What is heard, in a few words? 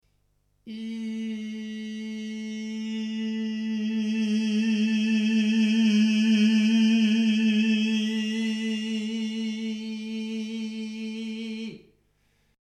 Bright Chiaroscuro